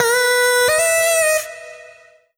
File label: Vocal Sample Pack Phrases B; Slate Digital Omnivox Vocal Sample Pack